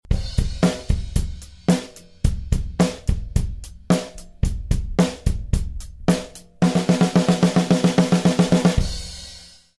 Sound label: beat